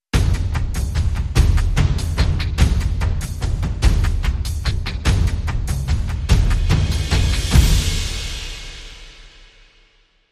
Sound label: Drum Outro